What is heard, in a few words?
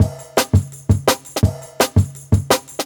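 Jungle Breaks, 168 bpm; Original Jungle Breaks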